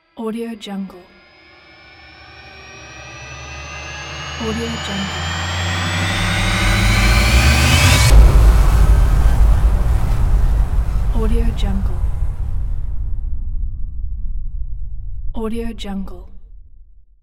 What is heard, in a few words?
space shuttle launch